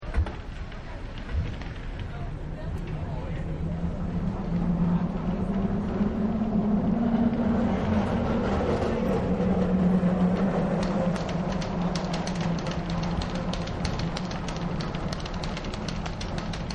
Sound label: Cable Car Pass, Streetcars; SanFran; Vehicles